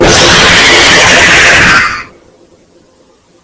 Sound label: jumpscare sound; five nights at burgees 2